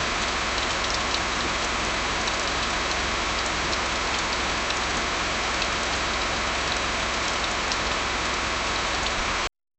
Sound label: medium heavy rain heard, indoors